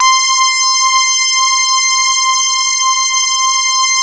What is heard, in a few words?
SAW RAVE, LEADS